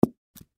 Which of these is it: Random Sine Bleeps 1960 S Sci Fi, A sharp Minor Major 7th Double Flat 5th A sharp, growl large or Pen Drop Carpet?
Pen Drop Carpet